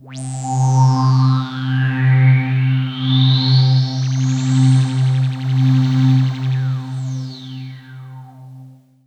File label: Kawai K11 Goblin C3